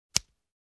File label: Small scissor cut